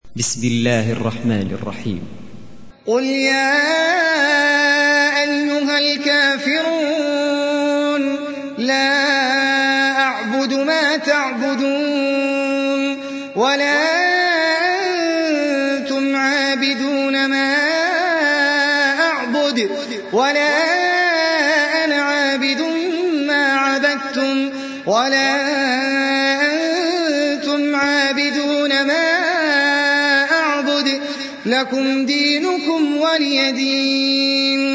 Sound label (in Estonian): Al Kafirun retsitatsioon, koraan